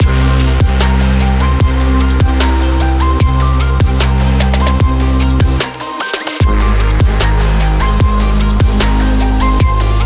adrenaline rush dubstep

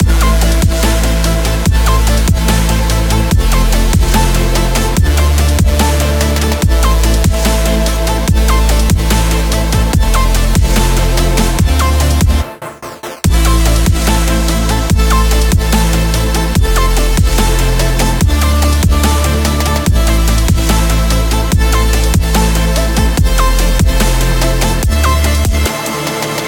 dark energy overdrive

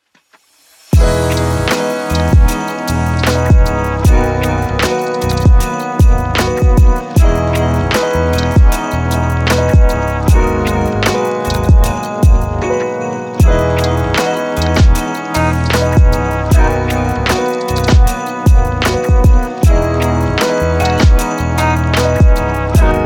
cozy christmas lo fi

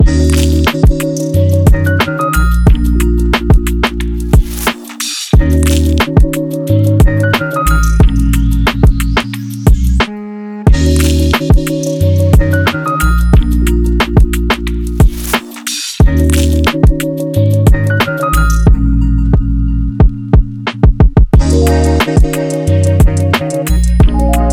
travel vlog

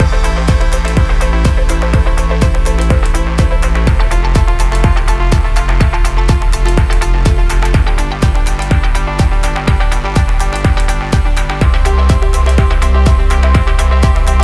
hi tech inspiration loop

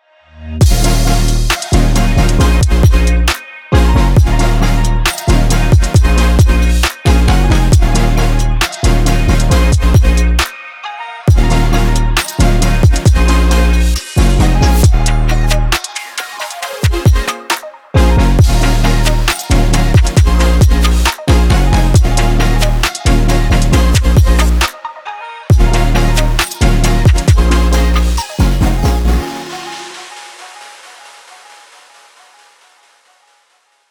stylish future bass

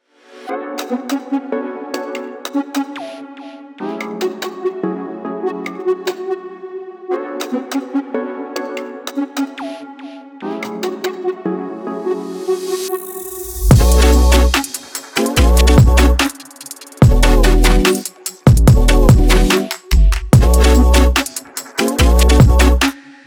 stylish instrumental

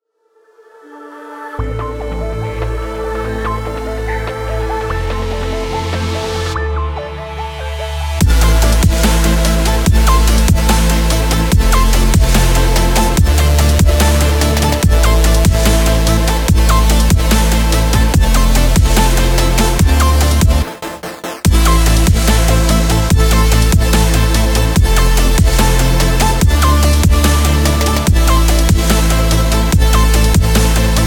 dark energy overdrive

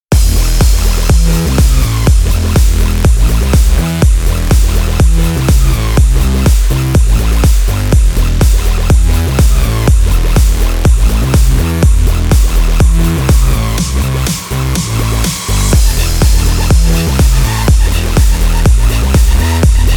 driving sport electronic

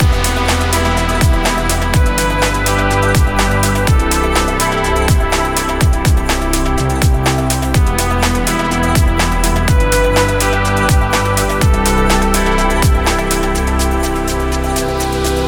futuristic technology dreams loop

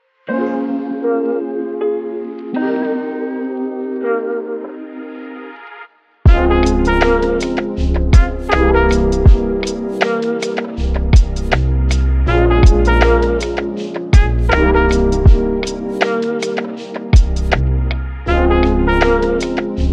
chill calm

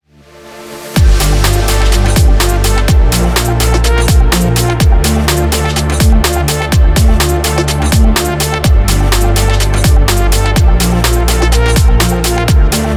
innovative background technology

